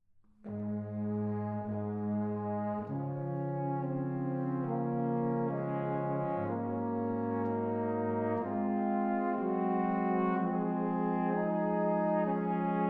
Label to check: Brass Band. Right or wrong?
Right